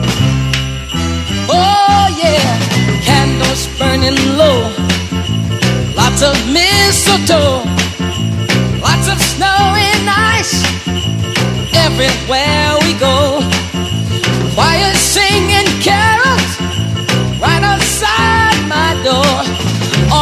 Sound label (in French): sonnerie noel